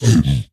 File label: zombie pig angry; mob; ayuncraft